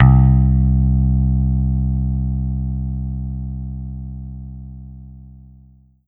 Roland SC-88 Picked Electric Bass C2

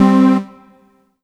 Yamaha TG100 Fifth Ld C4

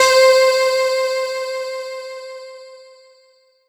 Casio CTK-611 Brightness C5

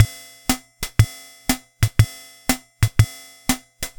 Yamaha PSR-22 Big Band

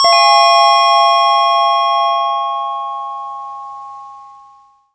Yamaha DX7 Chimes C6

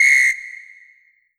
Korg N1R Long Whistle

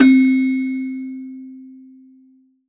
Roland SC 88 Celesta C4

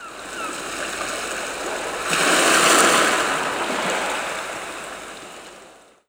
Korg N1R Seashore

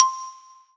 Roland SC-88 Xylophone C6

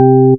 Yamaha TX81Z Perc Organ C3

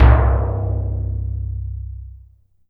Ensoniq ZR-76 Tympani Low

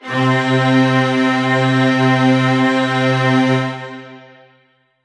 Kurzweil K2000 Grand Strings C3

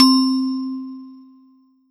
Ensoniq ZR-76 Octave Bell C4

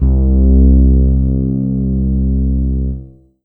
Yamaha SY22 Fretless C2

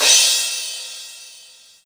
Korg M3R Crash Cymbal

